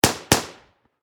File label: Warfare Machine Gun 7.62 Caliber M240B Double Shot Distant Perspective; Machine Gun Sound Effects